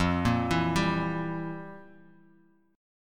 F Major 7th Suspended 2nd Suspended 4th, F, down, guitar; FM7sus2sus4 arpeggiated; JGuitar